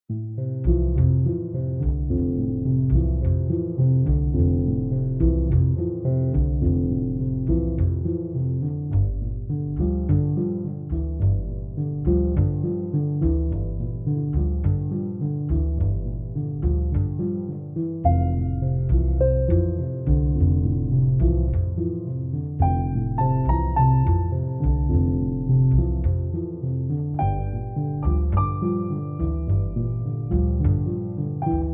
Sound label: Indie Film Example